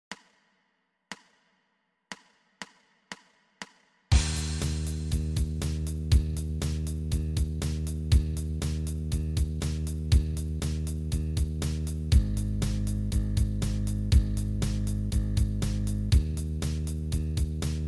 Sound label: bass startup eb blues 120